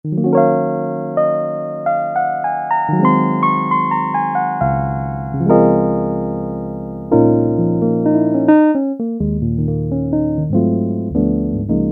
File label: PIANO